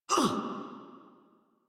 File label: Pain, Battle; Warframe; Grineer Catbrow Handler